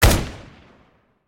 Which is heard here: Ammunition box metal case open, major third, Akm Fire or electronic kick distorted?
Akm Fire